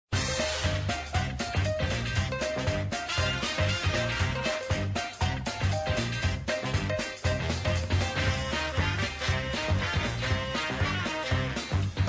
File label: bgm muzic